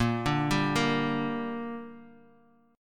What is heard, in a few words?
A sharp 6th, Guitar; A#6; arpeggiated; 6,5,5,3,x,x